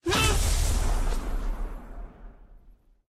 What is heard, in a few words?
jump; superbwarfare